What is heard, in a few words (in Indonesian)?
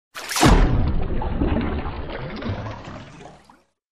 suara menyebur